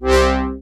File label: Synth Bass; Vengeance Samples; VEE Synth Shots